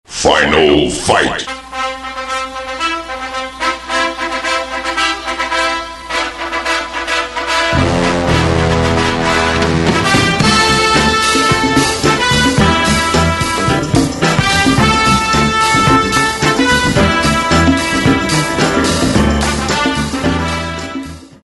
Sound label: song